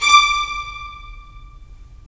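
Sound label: strings violins, left; Roland - LA Composer vol. 4; 90 s Sample CDs; Violins Sh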